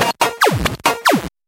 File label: Loops Fills; Vengeance Samples